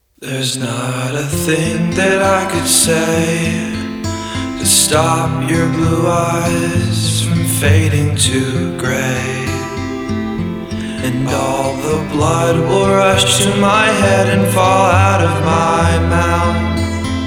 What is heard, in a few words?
Smooth Jazz